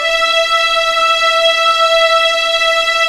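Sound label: E5 legato forte, violins; East West; The Ultimate String Collection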